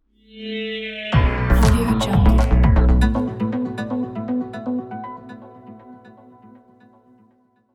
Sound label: cyber news logo; andrey bogdanov